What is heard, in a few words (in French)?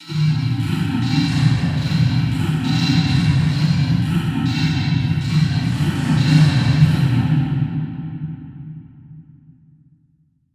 le puits, grotte; dans la grotte